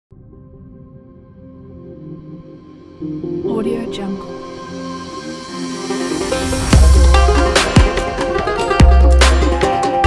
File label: arabic trap